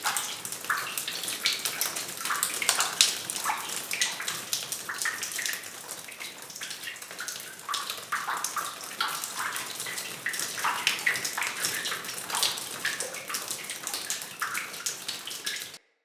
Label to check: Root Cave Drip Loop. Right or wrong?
Right